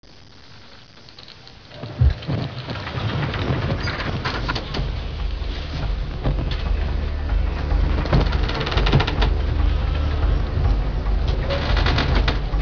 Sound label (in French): Grincement (bateau)